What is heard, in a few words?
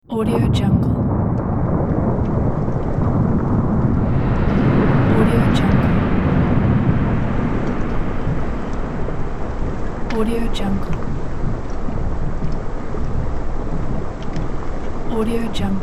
volcano eruption and lava flow